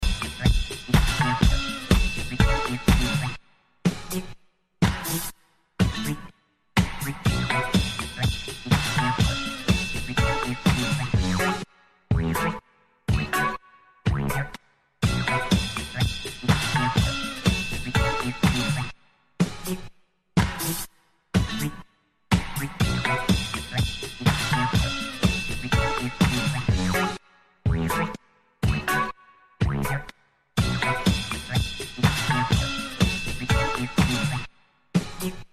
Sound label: music